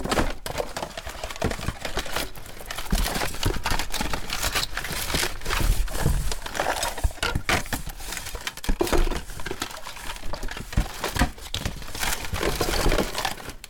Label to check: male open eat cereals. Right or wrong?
Wrong